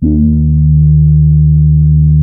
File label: TAURUS E2, synthesizer